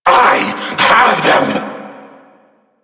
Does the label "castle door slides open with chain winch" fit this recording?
No